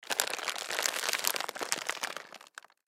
Food Bag Plastic, Foley Food Bag Plastic Open Rolled Up Pick Up; Household; Blastwave